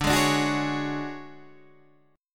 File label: D Major 7th Flat 5th Guitar Standard, chord; strummed